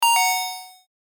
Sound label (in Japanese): ピンポン